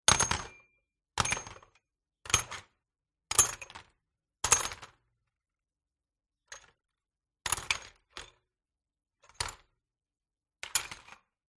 metal bolts being dropped into a toolbox heavy weighted; Sound Ex Machina